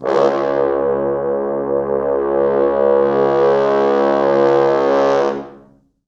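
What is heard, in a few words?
4 French Horns, Horns; C#1; East West; Quantum Leap Horns Sax; 4 FrHorns MOD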